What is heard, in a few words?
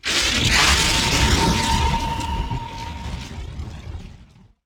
Teleporter Nebula Cloud End; Evolve; Wraith